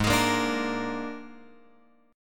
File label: G sharp Suspended 2nd Flat 5th, guitar chord; G#sus2b5 Chord {4 X 6 3 3 6} strummed